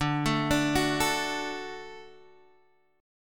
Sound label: D-Major, D